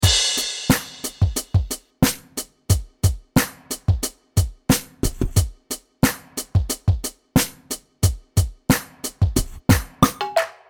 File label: beat